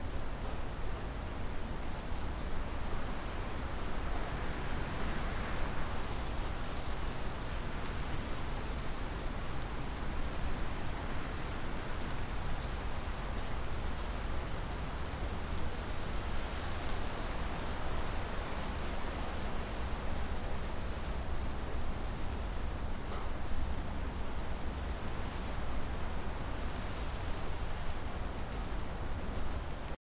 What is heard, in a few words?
outdoor rebuild town hill rain